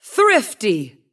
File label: applause; Clicking Head